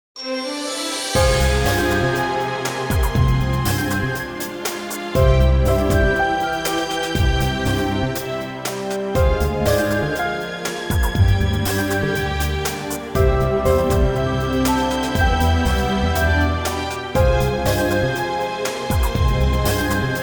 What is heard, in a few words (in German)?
Glocken; Sword Art Online Fatal Bullet